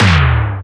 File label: Big E-Tom; Roland MV8800; SONIQ DRUMS